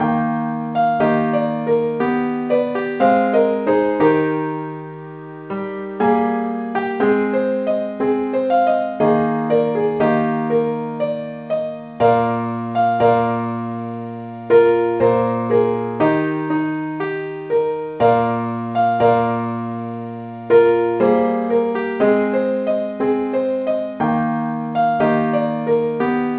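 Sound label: keyboard Only key A flat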